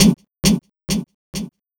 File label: Spitter Hit, Esoterik Beatz; Spitter Kit